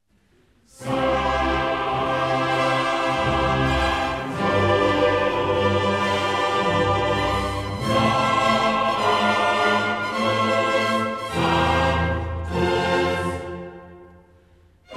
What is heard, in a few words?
Missa brevis in C Organ Solo Sanctus; Mozart; Davis, Gardiner